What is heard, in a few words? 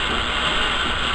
drag; CAMPING STORY